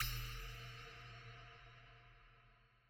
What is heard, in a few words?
vision enter